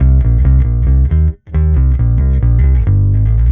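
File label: Electric Bass Neck Pick 136 C1; musicradar; bass boutique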